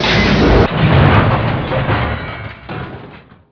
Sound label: door; action-quake2; myship